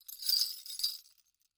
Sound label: Bell Sleigh Bells Shakes Slow Short; Foley